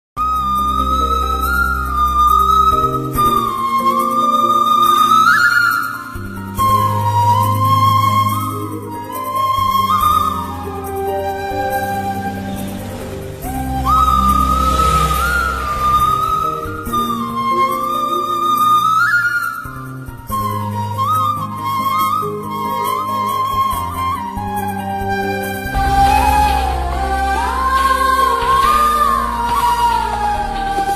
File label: love bgm tamil; gilli; vijay; trisha